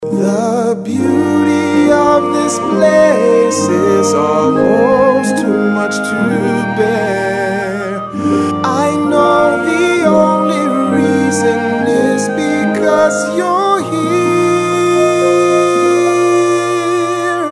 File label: ring tone